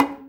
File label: Conga; Equipped Music; Loungin House